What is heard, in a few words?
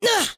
Damage